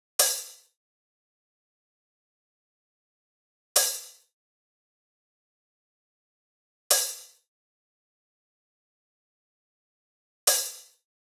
143 Gm open hat

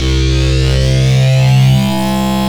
Hard Bass, C; Cynical

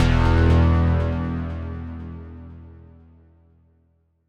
Bass Stab 120bpm E

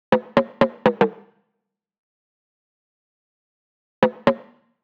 Perc 123

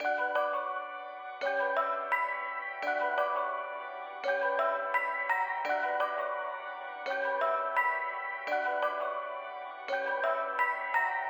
Pluck Bm 170bpm; SAVAGE MOOD vol 2

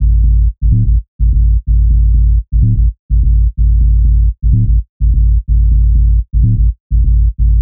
126 D Sub Bass

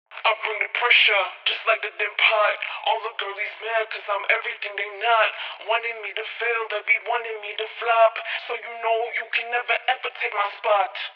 Phonk Vocal 172 BPM; Cymatics; Pressure

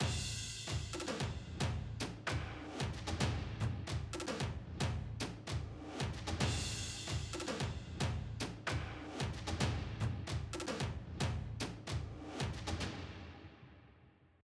Break Orchestra Drums

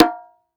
bongo lo high slap dry

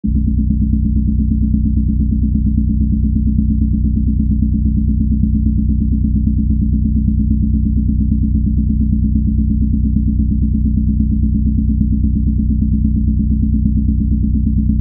BASSLINE

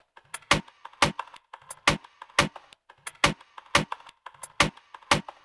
88 Fmaj Top Loop; Ilusionar

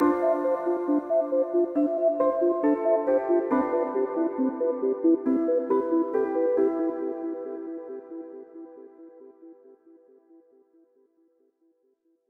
137 bpm, B

